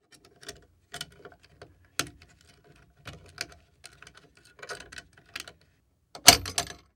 Industry Lock Metal Lock Pick And Open

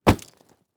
Impact Body Fall On Dirt And Rock

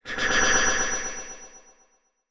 Title Transition Delay Short; Production Element Title Transition

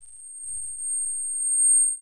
Sci-Fi Electronic High Pitched Noise; Science Fiction

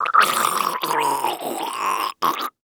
Horror Male Choking

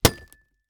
Impact Board Wood Hit By Medium Metal Pole; Impacts

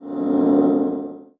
Multimedia Internet CD-Rom Flash Whoosh

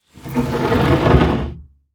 Foley Movement Wood Slide On Wood Short

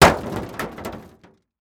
Impact Metal Bang With Roll; Impacts

